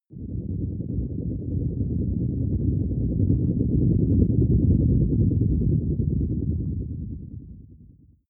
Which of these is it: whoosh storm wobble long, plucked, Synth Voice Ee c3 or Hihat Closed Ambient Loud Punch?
whoosh storm wobble long